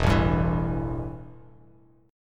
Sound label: E Minor Double Flat 5th E, Bass Standard; strummed; JGuitar